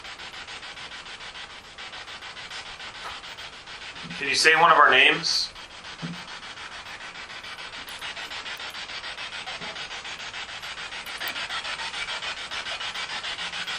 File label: Seance Room Spirit Box; wildwood sanitarium investigation